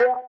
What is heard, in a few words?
VIBRA WAH, guitar; Spectrasonics; Bizarre Guitar